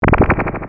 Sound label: mind